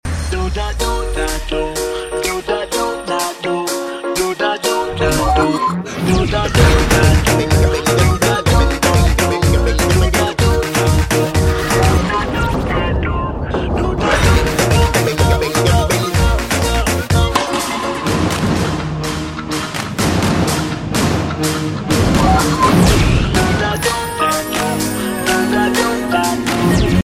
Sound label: Brand Tone; MTS